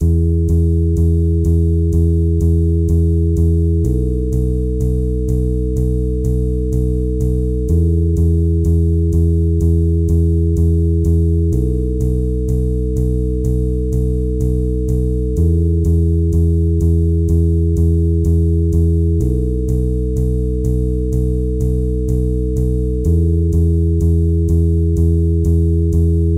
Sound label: Zen Waves 1 to 5 in E; Mike Cirillo; Musical Self-Actualization; Mike Cirillo Method TMSA